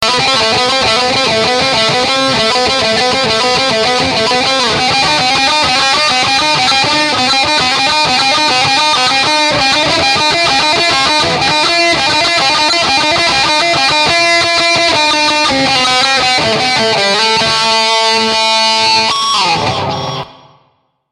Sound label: Tripplet guitar solo